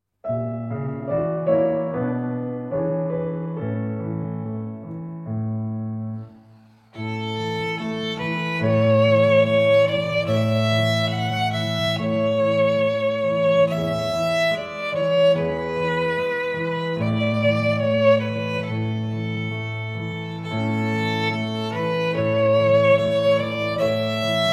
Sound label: Long Long Ago in A Major; Arr. S. Suzuki for Violin and Piano; 80 percent; Suzuki Violin – Volume 1